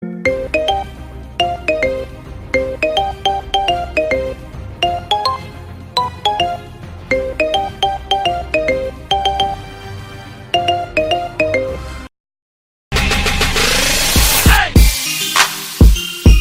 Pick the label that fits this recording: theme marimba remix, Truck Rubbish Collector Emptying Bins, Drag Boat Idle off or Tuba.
theme marimba remix